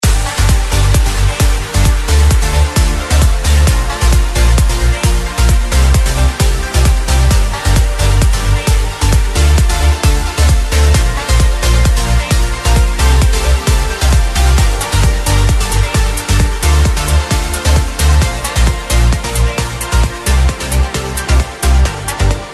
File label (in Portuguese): Trilhas Dance